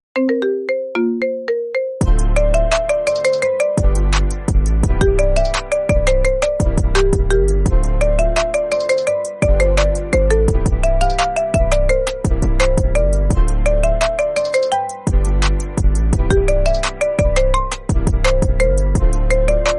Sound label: iphone kpop marimba remix